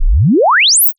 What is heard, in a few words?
sine sweep log